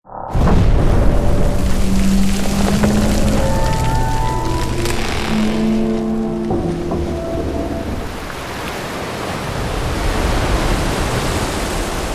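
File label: Water Rush Splash Earthquake Collapse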